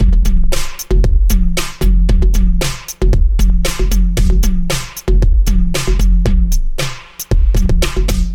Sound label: Electronic Drum, Loops